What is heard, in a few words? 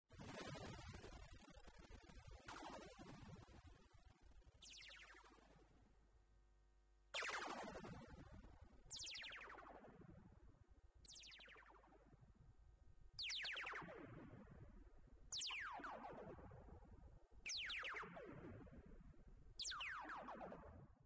Cyberwar